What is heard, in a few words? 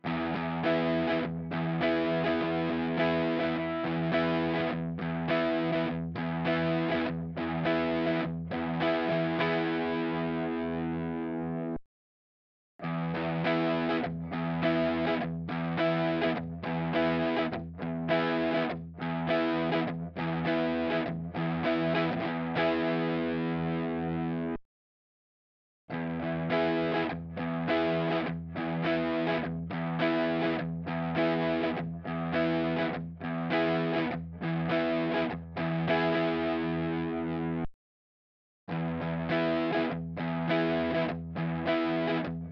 Test cables OD